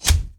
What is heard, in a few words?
player Hit; soldiersfury